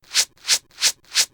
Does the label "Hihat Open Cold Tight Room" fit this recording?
No